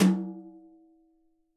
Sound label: DW Tom Brush, drums; PX7; Digital Sound Factory Protean Drums